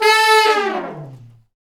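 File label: Brass Fat Falls; Roland L-CD702; Fat Section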